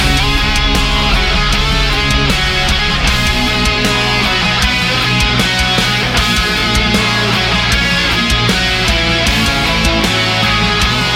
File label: Metal; Necalli